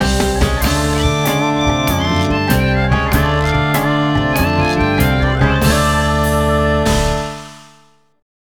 Gameover; 18 Wheeler American Pro Trucker; PS2

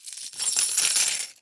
Coins

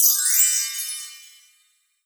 Chimes Magical Bells

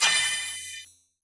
Music Frontend

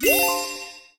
Gacha Result

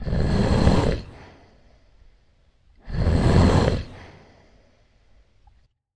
Shutter Snore

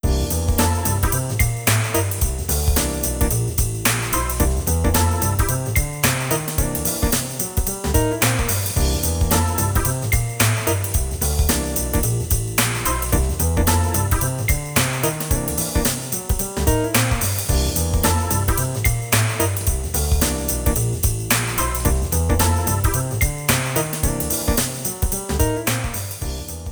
License Bronze ⁄ Money (2nd-6th); Gran Turismo Japanese Edition

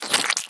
Water Splasher

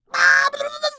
Water Splasher

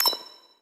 Message Click

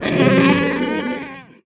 Toad Creature Death Roar; gamerip; Mars Sortie

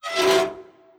Spider Squeak

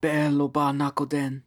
Exhausted

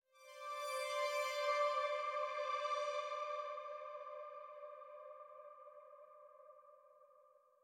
Progression Stinger

